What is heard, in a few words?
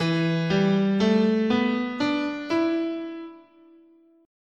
E Minor Pentatonic, scale